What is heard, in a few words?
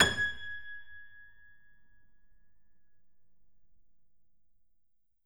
Grand Piano G#5 ff; Ableton Live 9 Essential Training